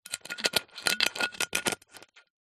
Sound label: soda glass bottle metal lid screwing top movements; zapsplat